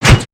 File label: Sword Slash; aronwk collection; ndaudio